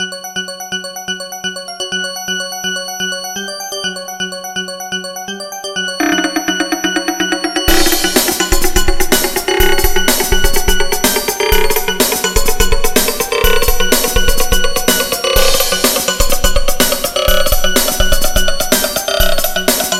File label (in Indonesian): Nada Dering Nokia Jadul